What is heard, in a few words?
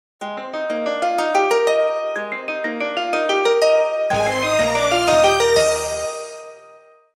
Tone; Samsung; SGH-A697 Sunburst